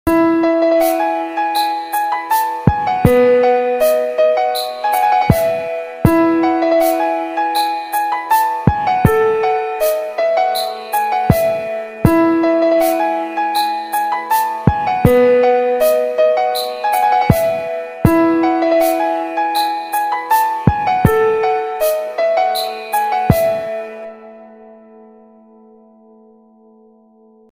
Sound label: instrumental ringtone